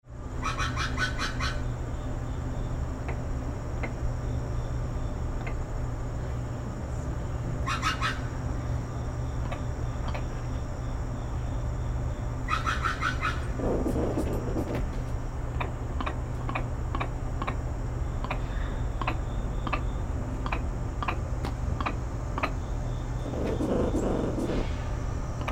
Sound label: alarm playback; Hapalemur Playback